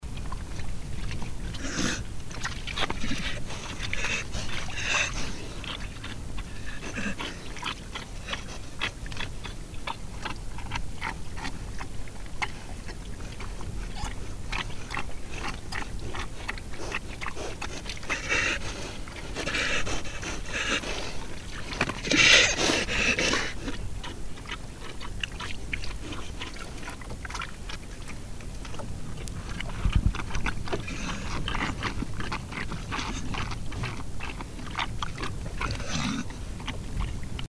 thirsty teenage pigs suck water from a tank and get out of breath